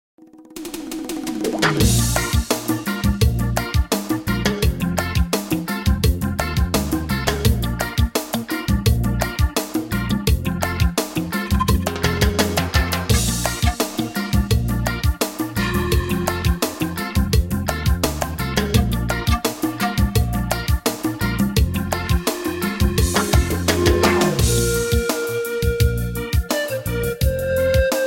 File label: Reggae